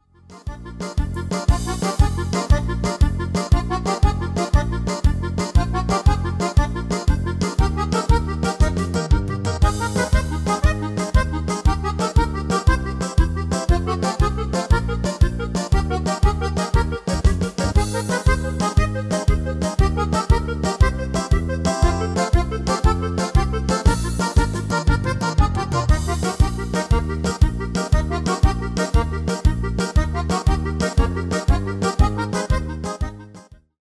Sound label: MIDI